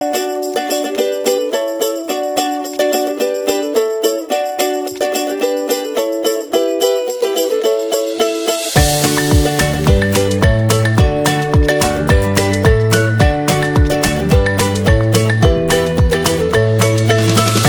bg music; Pingu-&-Friends

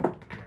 pocket, 8 ball pool

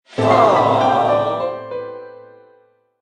jingle lose; pool8ballmania

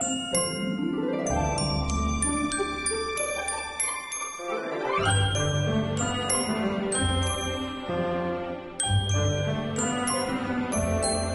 character selection; frozen rush

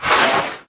rocket shoot; sweetcandymania

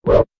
swap; jewelpetsmatch; jewels

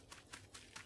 run; star wars rebels team tactis; sabine

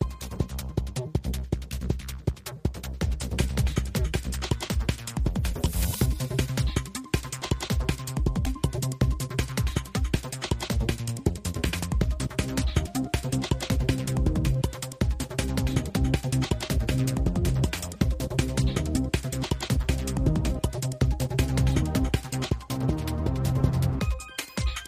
music japan race loop; lightning speed

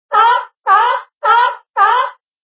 seal; inlogic-arcticpong

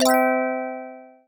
result gold star; papersiomania

